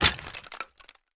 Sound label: wood break